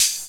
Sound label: Open Hhat, HiHat; Equipped Music; Loungin House